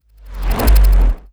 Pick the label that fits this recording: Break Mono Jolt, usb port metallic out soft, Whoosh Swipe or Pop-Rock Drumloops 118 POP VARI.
Break Mono Jolt